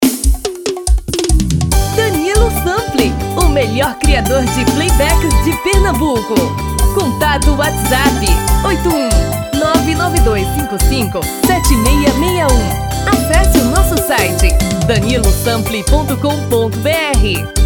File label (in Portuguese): Brega